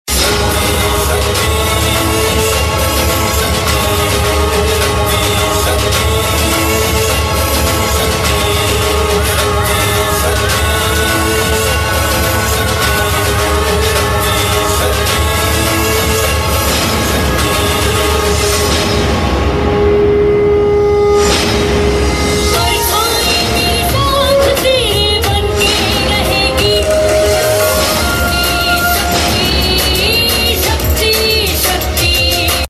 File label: BG Tune; TV Serial Tone; Colors TV; Shakti - Astitva Ke Ehsaas Ki